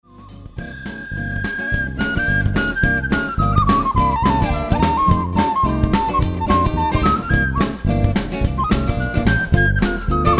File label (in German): Flut Boogie